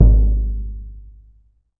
EDGE TONE, Surdo

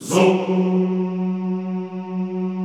ZOOMMM, Extended Classical Choir; Best Service

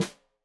Snare Stereo, Drums; Deeply Sampled Drums

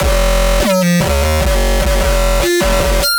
Phase Plant, bass

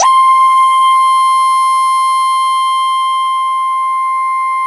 RESOTRAIL, JV-1080

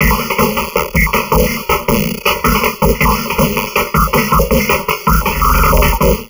160, Drum Loops Crazy Processed

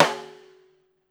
STR SNARE; Drums&Percussion; E-MU Producer Series Vol. 7 – Old World Instruments

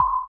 SONAR R; Spectrasonics; Metamorphosis

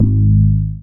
BAS FM DX-7

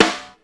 Wolfe Snare, Ensoniq ASR-X Pro

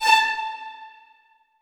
A 4SPC, HALL STRINGS; AKAI S-Series CD-ROM Sound Library VOL-7

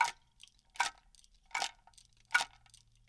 RATTLE; Spectrasonics; Liquid Grooves 1 & 2; AF.PLANET

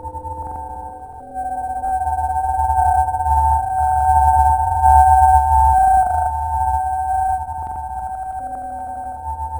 Spectral Sonar; Sonic Foundry (Sony Creative Software); Loop Noir; Session 12 - Otherworld Journey

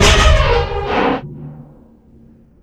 METAL, Disjointed Textures; USB Soundscan vol.33; AKAI; BLASTER

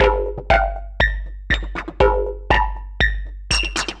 Resonated 808, Drum Loops

